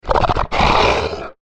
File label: Monster Growl Breathe Snarl Mammal Creature; Animal; Monsters; Blastwave